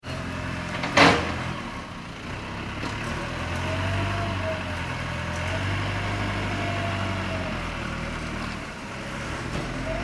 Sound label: industrial vehicle jcb, Backhoe Operating; Machinery; Machines